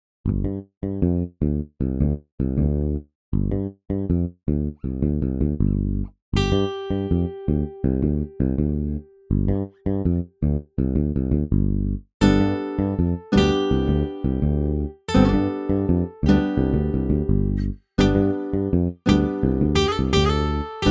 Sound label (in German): Klangimprovisation